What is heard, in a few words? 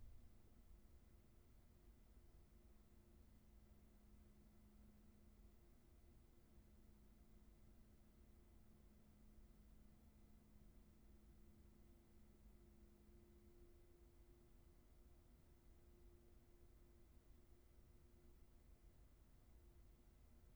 room tone